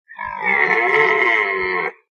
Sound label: SFX - Camel